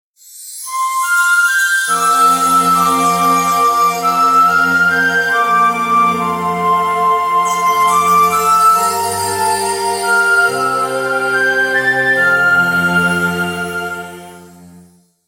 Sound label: Sword Obtain Tinkle